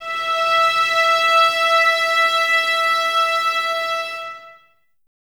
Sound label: VNS E 5, Violins, String Ensembles; Miroslav Vitous